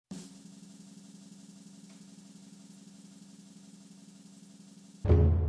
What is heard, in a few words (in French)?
tambour; drum; Ajout du son pour le tambour; code game jam drop plafond 2025